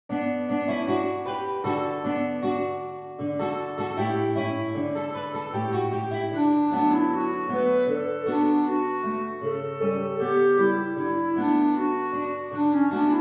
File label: i have a bonnet clarinet